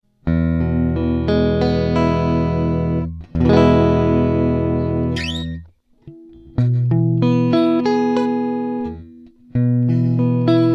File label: new guitar strings